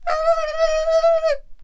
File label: Bomb Cannon Attack Vocals